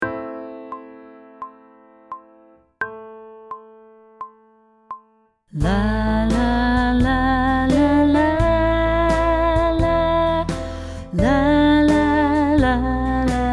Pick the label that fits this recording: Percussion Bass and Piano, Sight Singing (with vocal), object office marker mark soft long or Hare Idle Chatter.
Sight Singing (with vocal)